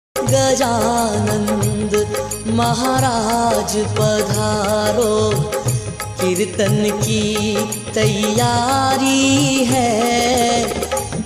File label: Marathi; Gajanan Maharaj